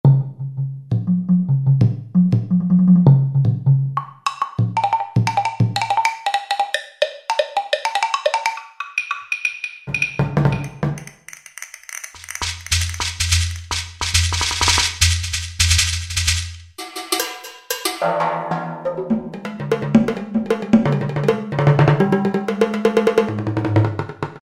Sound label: PERCUSSION; Best Service Gigapack